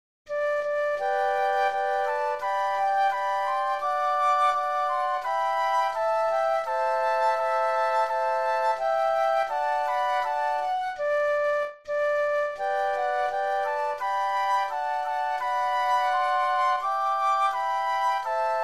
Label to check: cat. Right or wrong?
Wrong